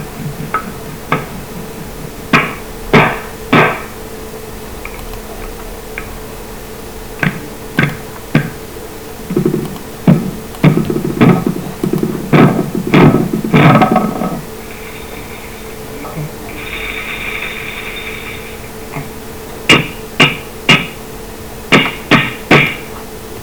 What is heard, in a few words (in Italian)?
intensità strumenti